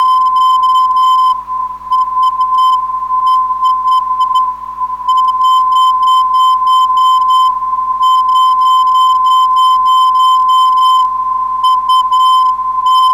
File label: 470kHz, ndb; UZ